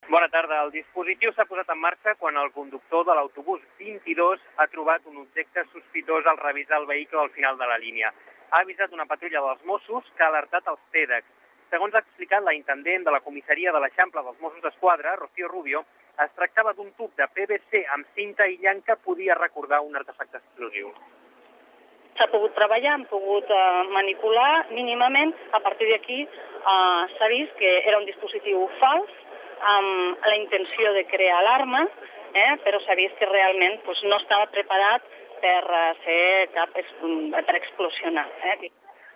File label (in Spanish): falsa alarma; barcelona